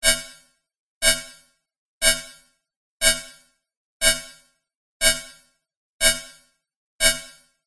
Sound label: science fiction alarm warning buzz harsh dry